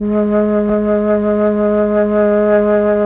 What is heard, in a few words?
FLUT; ILIO; Propeller Island Legendary M400